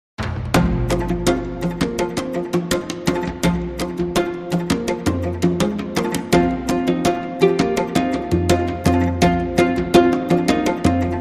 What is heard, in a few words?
Mobile Ringtone